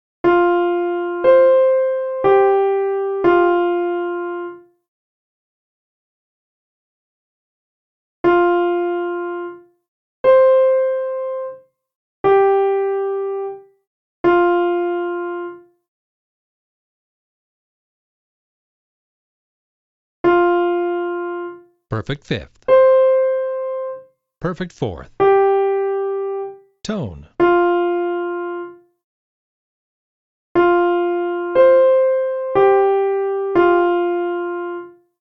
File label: Hard Melody Intervals